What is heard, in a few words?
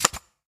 Click Back Sounds